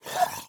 spitting zombie prepare; Hollow Knight

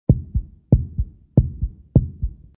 Heartbeat

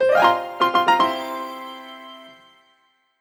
Unused Fanfare

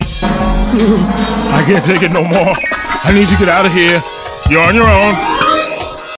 Entering Cool Mode

